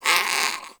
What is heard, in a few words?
Rodent Vocal Tranq Dart Grunt; Warframe; Hunting Critters; Characters